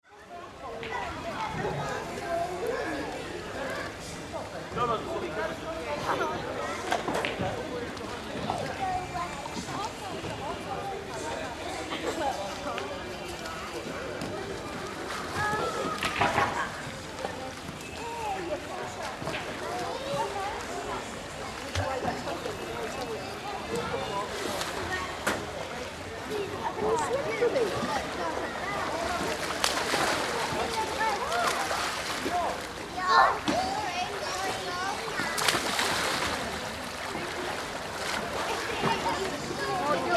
Surround hotel resort poolside Stereo Downmix, Children and adults; Hotel Resort, Poolside - Children and adults hanging out near swimming pool. Some people playing and splashi